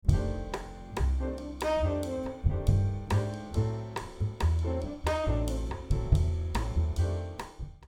Bass Mic DI; Meld

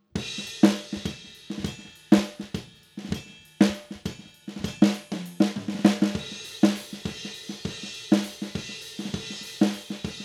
Snare Notch Out

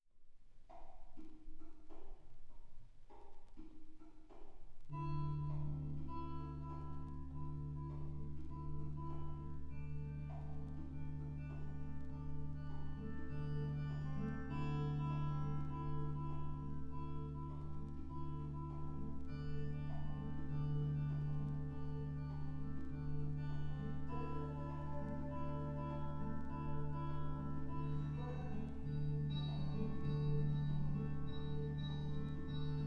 Mix Fairy Dust Solo

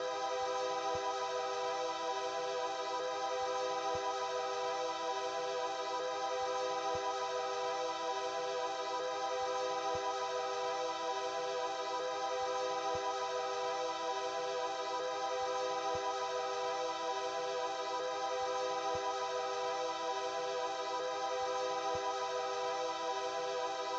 Synth Pad Stereo Phase

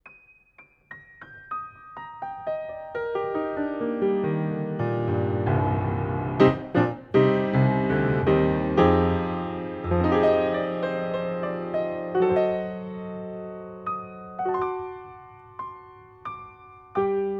Piano, 30cm Cardioid Pair

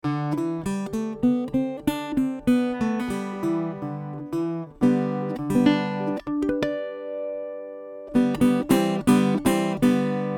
Banjo One Mic; Manufacturer Audio

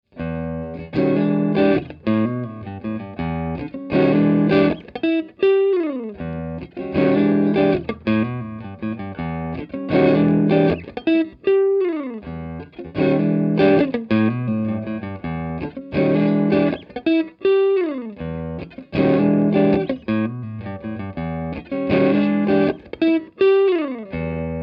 Distance Elec Gtr Mid

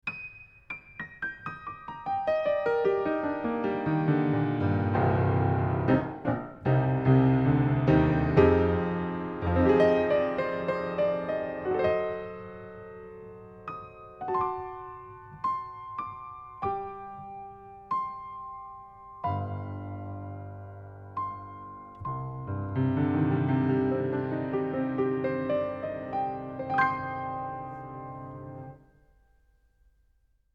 Piano, 30cm Omni Pair Lid Off

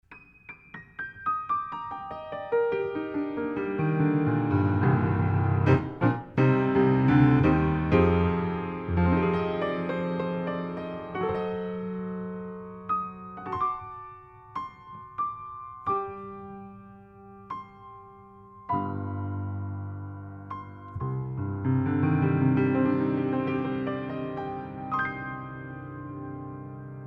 Piano, 15cm Omni Pair

